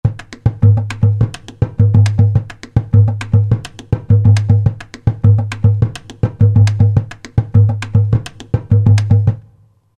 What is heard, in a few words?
104 Bombo Lp; World; Latin; Roland SR-JV80-18 World Collection Latin